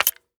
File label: usp clip out, weapons; Counter Strike Model Pack; CS:GO